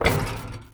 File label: Street Ball Acrylic Basket; SFX Kit